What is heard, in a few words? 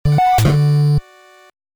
Item Found; Cosmo Police Galivan; NES